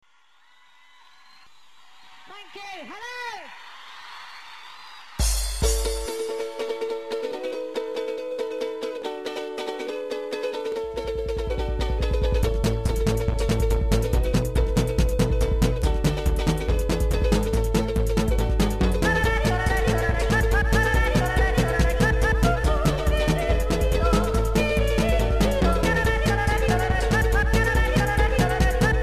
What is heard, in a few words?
uke can yodel